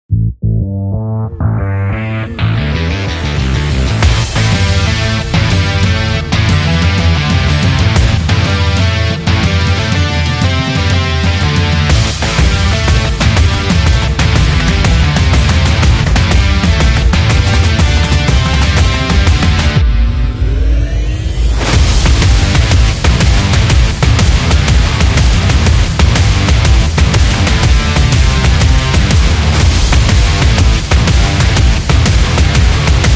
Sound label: The Trailer Rock, Powerful background music for sports, trailers